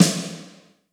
long tail reverb snare e key

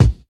modern sharp kickdrum a sharp key; kick drum samples

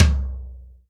large room tom drum one shot c key

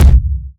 subtle reverb bass drum sample c key

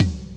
acoustic tome drum sample f key; tom drum samples

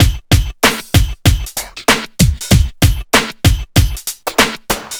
96 bpm breakbeat g sharp key; drum loops

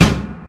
acoustic snare b key

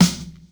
acoustic snare sound f key

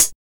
dry hat sound g sharp key

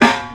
rock snare sound a sharp key

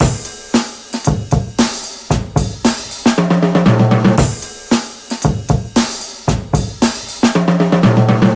115 bpm drum beat c sharp key

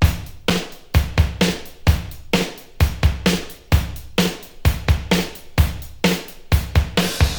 129 bpm drum loop sample d sharp key

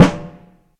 low tone steel snare drum f sharp key

